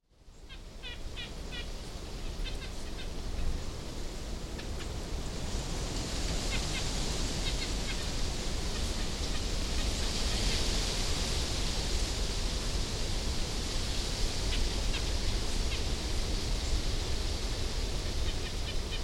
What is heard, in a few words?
Wind Aspens